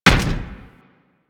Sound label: Step Default, Footsteps; Warframe